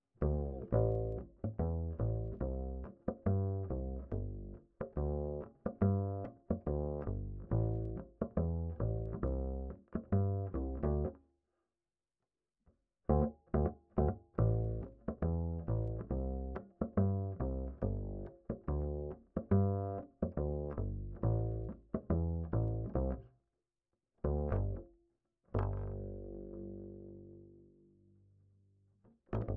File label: Bass Line